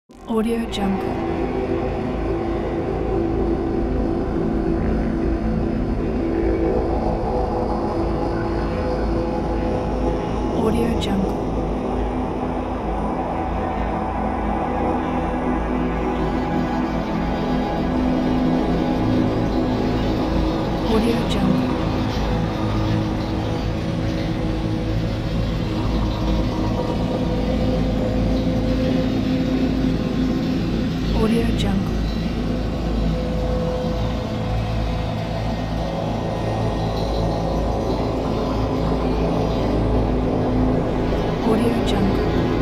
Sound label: dark horror ambience; phillipmariani